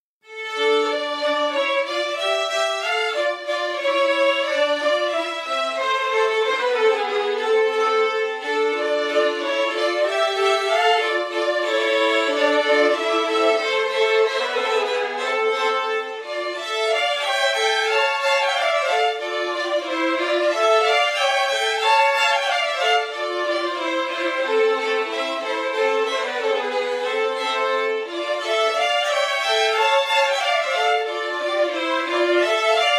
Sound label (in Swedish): Polska; Hanna Jonsdotter